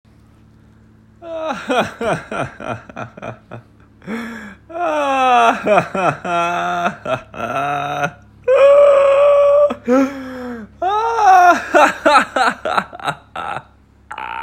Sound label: Laugh Therapy